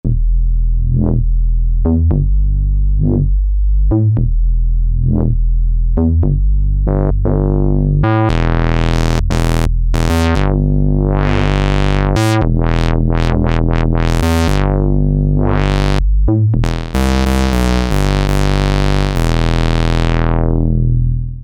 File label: Hoodie Bass